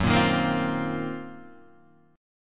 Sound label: Eb Minor 6th Add 9th Gb, guitar chord